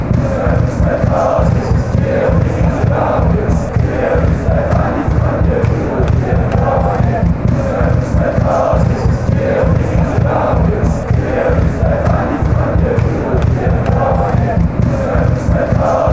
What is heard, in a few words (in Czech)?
uz jsme tady, fan chants